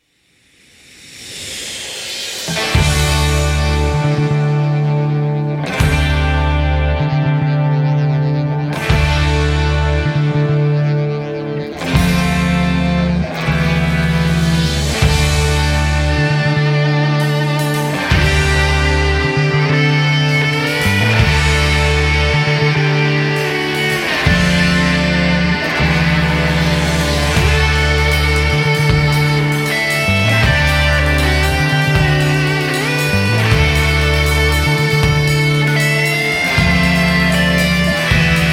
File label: Instrumental Mix